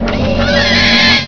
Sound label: robot; Descent